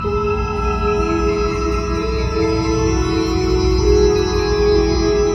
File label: Mystical